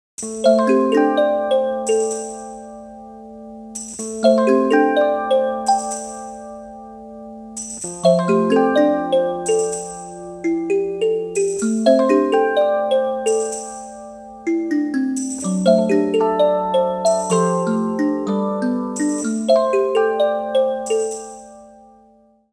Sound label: marimba, ringtones